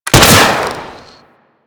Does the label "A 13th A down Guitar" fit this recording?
No